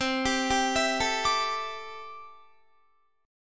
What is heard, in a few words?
C 6th Add 9th C down Guitar; C6add9 arpeggiated